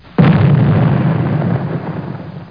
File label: blast